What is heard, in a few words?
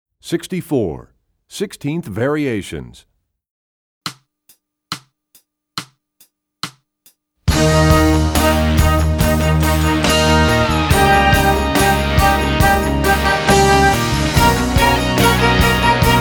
Sixteenth Variations; EE2000 Book2